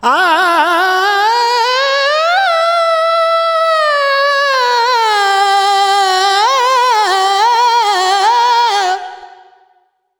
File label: Expression, Vocals Male World Pop; Sonic Foundry (Sony Creative Software)